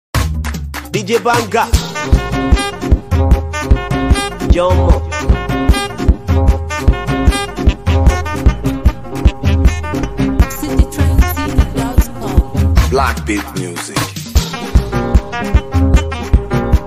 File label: Northern Mara Dance Beat